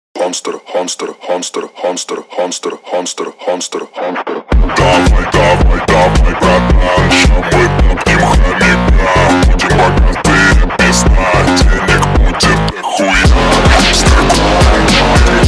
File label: Phonk remix